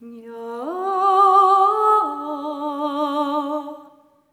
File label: SERENE, Symphony of Voices; Spectrasonics